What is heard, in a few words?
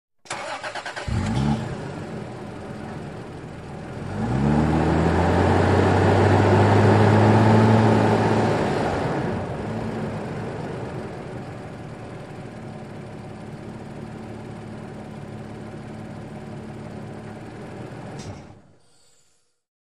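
SUV Start Engine Accel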